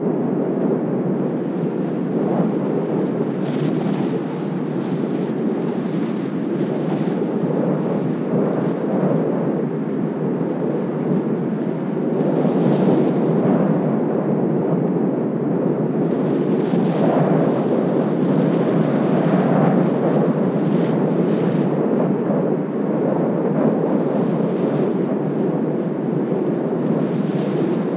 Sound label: Wind heavy; ambient; Project Gotham Racing